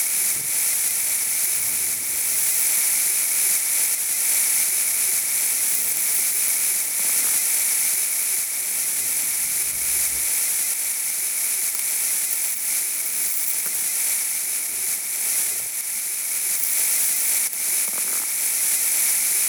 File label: diving Suit Oxygen Leak Loop